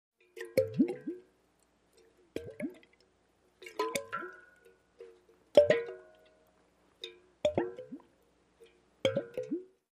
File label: Water Bottle Glubs. Large Bubbles